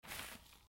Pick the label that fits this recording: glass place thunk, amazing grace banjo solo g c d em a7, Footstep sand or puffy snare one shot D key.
Footstep sand